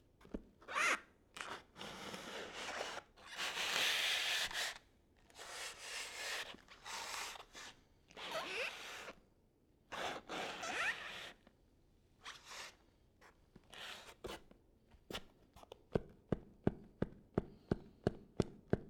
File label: Footscraping Shoes Moving; Todd Blankenship; Footsteps